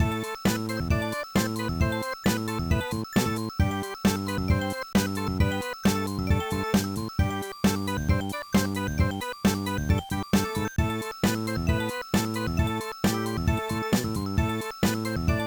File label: Snowy; Mario Pinball Land